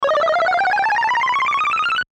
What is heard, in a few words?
Video Game Sequence Ascend; Blastwave